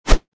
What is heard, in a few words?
Battle axe swing